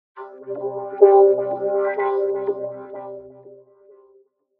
in Swobbler, Vocoder

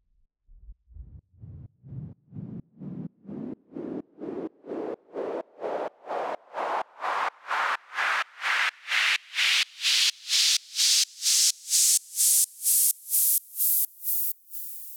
Raise SideChained

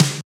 Snare

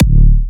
Hitter D4, Kicks, Big Room Kicks

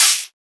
Open Hat; FLPRO

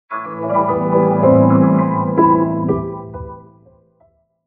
in Grand, Vocoder

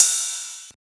Ride; FLPRO

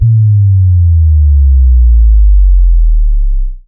SubDrop Basic Steady

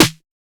Snare; FLPRO

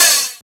Open Hat; FLPRO

